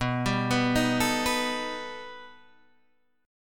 B Augmented 7th B down Guitar Standard; arpeggiated; JGuitar

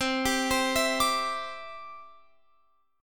C Major Add 9th, C, down, Guitar, Open F; arpeggiated; Cadd9

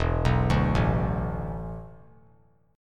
E 7th Suspended 4th; E7sus4 arpeggiated; JGuitar

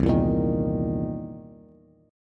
C 7th Sharp 9th C Bass; strummed; JGuitar

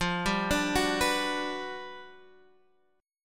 G Major 7th, F; arpeggiated; JGuitar

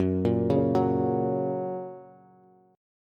Gb Major, Bass; Gb arpeggiated; JGuitar

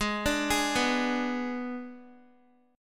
Ab Diminished Ab Guitar; arpeggiated

